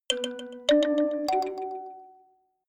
notification kalimba delay triad up minor high

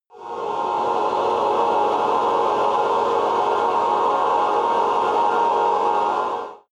evil choir chord dissonant high

